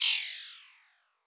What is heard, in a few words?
Title Transition Laser Short; Production Element